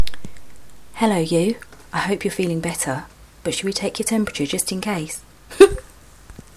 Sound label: hee hee